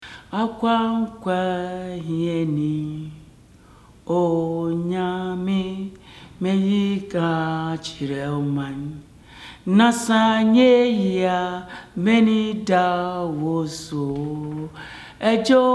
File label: Traditional Song; soundtrack samples